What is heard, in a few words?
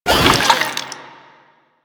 Proto Infested Runner Jump Whoosh